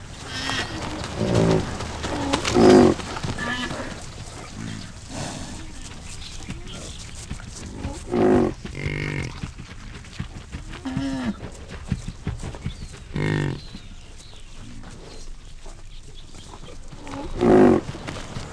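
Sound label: ambience rhinos; animal; Tony Hawk's Project 8; XBOX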